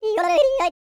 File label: YODEL; ILIO; Vocal Planet